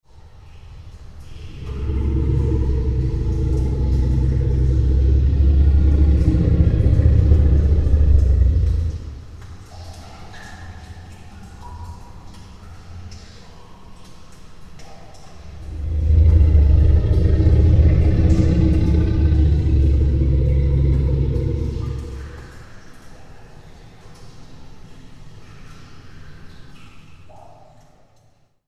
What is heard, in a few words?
cave monster